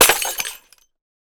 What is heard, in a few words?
Sliced Coffee; Boomerang Fu